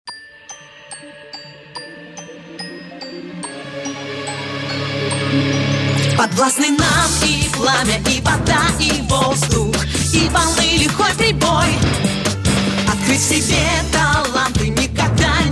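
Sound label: theme song Russian; W.I.T.C.H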